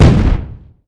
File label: Title Thump